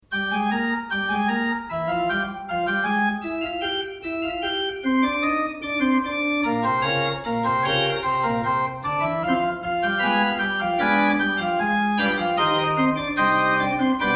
Prinzipal

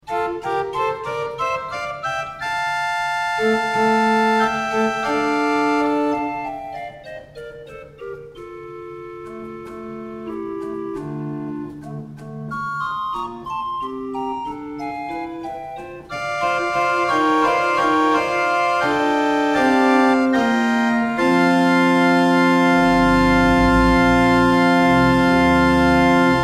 Zwei Manuale

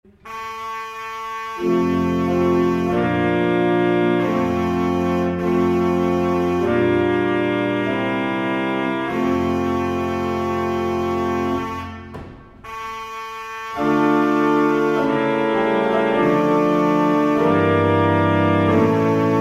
Engelstrompetenkonzert

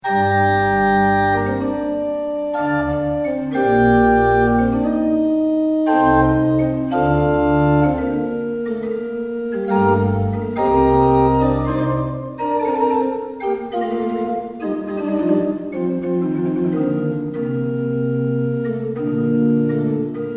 Coppel und Flöte

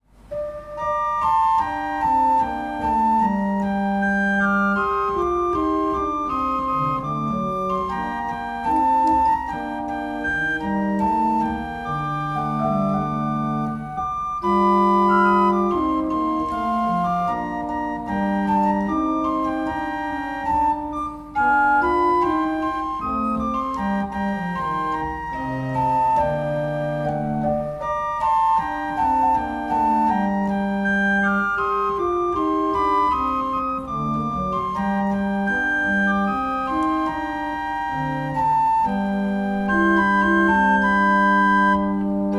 Spielstueck mit Orgelbauerschluss; traversfloetenorgel buehler

